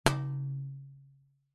impacts metal small road sign knock hit with small metal object resonate